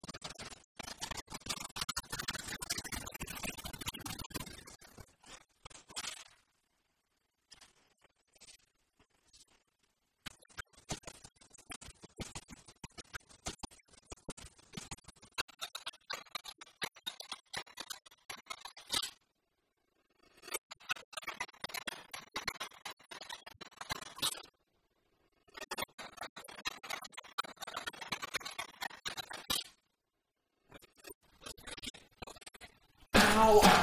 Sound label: Music Box